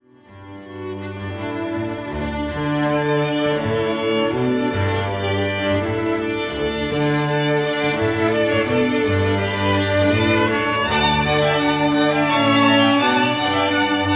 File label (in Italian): ciaccona